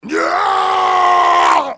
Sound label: groin, pain death, male; Soldier Of Fortune II Double Helix; XBOX